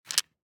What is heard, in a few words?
DICE on Felt, Grab, Standard, 5 Five Dice; Samples; ArticulatedSounds; DICE Sfx Party (Extended)